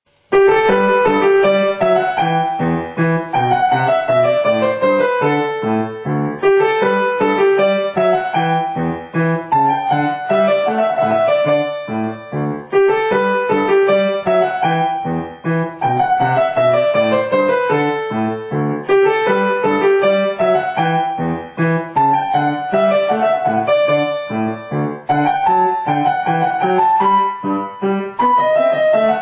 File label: gavotte